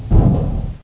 duct, player; hl2

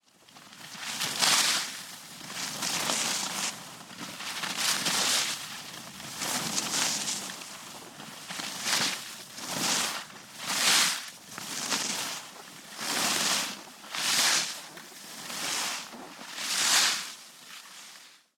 Skiing On Soft Snow; Ski Ride

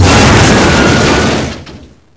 pipe drop, metal; hl2; impact

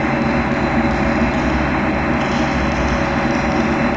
freezer room, ambient; hl2; borealis

